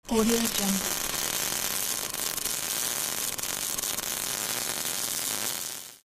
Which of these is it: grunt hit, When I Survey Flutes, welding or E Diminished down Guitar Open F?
welding